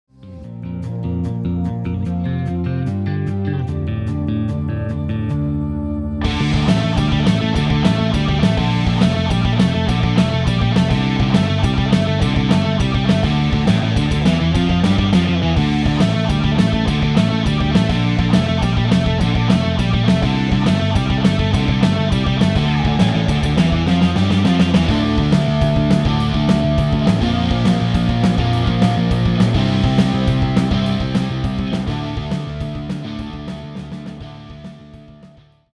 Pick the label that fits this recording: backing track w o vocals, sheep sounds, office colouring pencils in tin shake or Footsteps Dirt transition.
backing track w o vocals